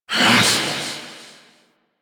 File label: Vocal Melee; Warframe; Wraith